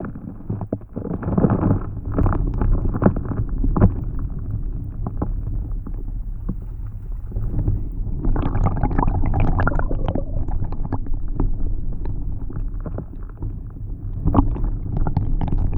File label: River through Wood; Boise Idaho